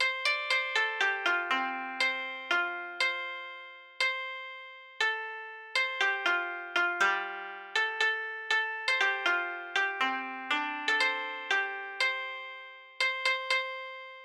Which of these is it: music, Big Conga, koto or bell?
koto